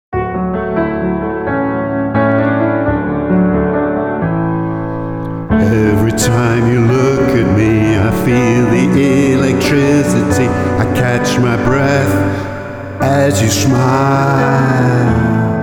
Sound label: Pour A Little Love 88 BPM in D with Vox